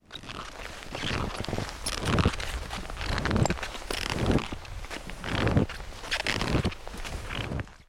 snowshoe

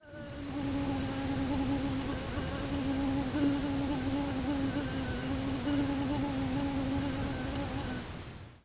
real mosquito

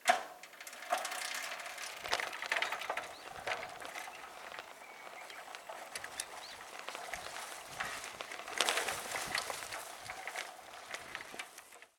bycycle

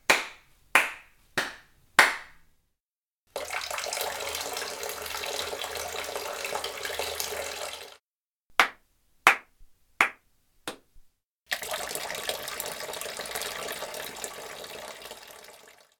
bathroom

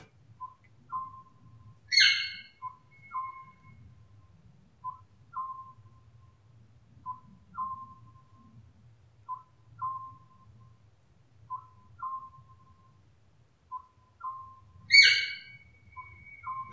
Scops Owl

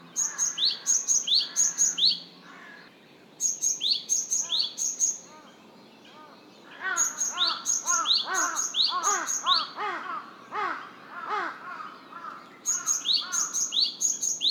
Great Tit song

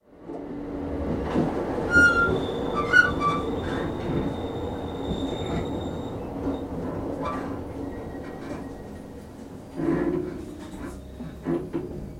train stop

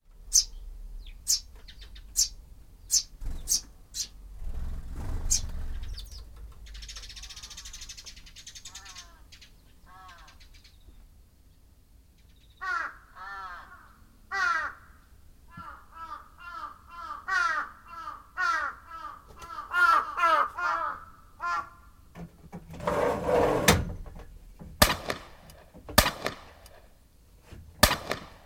suzume

